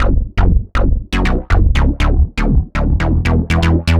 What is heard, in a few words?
Bubble Bass; Sonic Foundry (Sony Creative Software); The Electronic Music Manuscript Collection; Bass Foundations